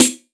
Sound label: One Shot Snare, Drum N Bass - Percussion; Sonic Foundry (Sony Creative Software); Knutrix Drum & Bass